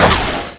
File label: bumper car decelerate quick; tf2